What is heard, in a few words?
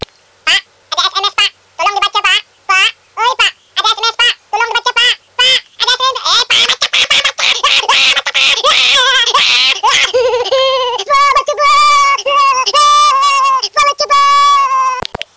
Sms